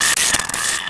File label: houndeye hunt; gearbox